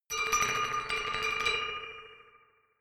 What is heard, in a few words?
glockenspiel dark tremolo low short